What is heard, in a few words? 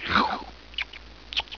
chew